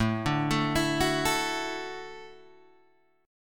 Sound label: D-Major Add 9th A down Guitar Standard; arpeggiated; JGuitar